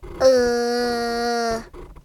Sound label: Uuh